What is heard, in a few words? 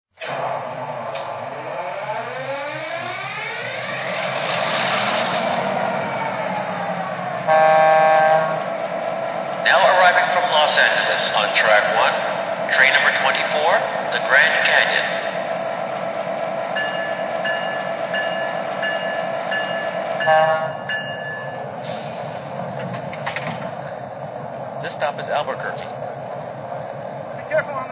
f3 aba, trains